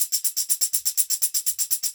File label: Tambourine, Trip-Hop Loops; Sonic Foundry; Rondo Brothers Trip-Hop Loops & Samples